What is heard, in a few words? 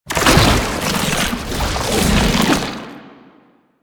Proto Infested Turn Full; Warframe; Babau; Foley